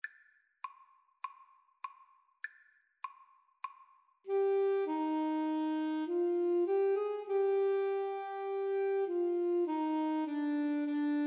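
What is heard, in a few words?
Alto Saxophone Duet, karaoke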